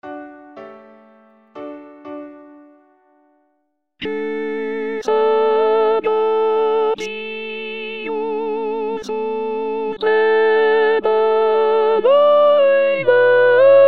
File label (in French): alto lent